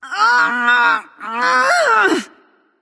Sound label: grunt lift light; smite; voicepack; hel